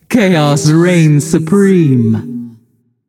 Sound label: "yay"; smite; voicepack; nuwa